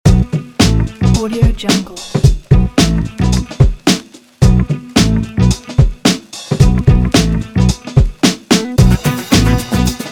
upbeat funky logo

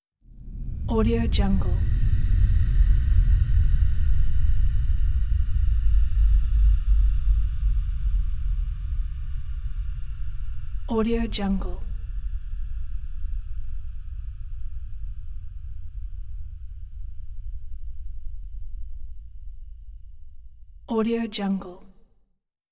distant scary ambience; hollywoodedge

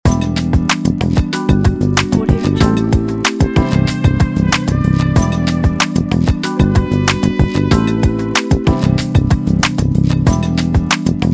jazz lo fi loop

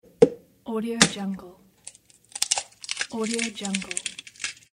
egg break

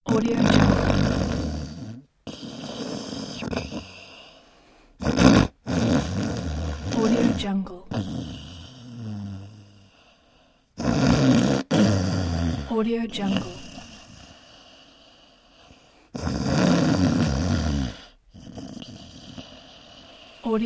snoring man

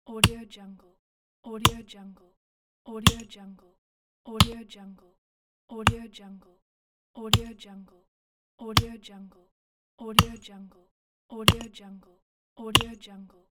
wood chop impacts pack

